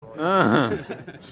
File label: laugh; Kawhi